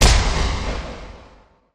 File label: Big Shot Impact